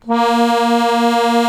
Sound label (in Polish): AKORDEON A#1